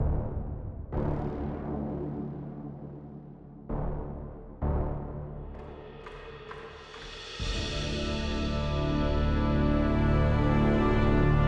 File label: Level Music Ambience; Sudden Strike 4